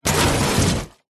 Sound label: impacts junk hard crash metal plastic wood glass